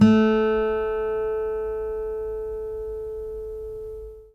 ACSMEDPICK, Guitar; Quantum Leap; Guitar & Bass

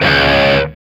D 3, Soldano rock guitar; Spectrasonics; Hans Zimmer Guitars VOL-1

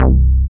BAS, Eurotech; Sounds Good

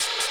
KIT, Dance Mega Drums, HIP

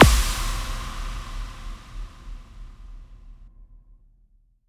FX Kicks; Vengeance - Essential Clubsounds Vol.4; Effects

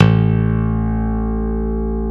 A0 LONG, STIK BASS; D-STIK BASS1

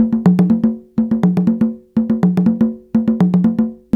120 JU, African Contemporary Ju Ju; Spectrasonics; Supreme Beats

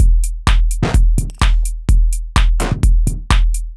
127bpm, House Drumloops

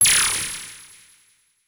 CABL TEN, CABL TENS; Total Control - Action Cutz

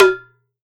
MIXED DRUMS; Best Service ProSamples vol.52 - World Instruments 2; AKAI

